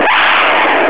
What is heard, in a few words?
howl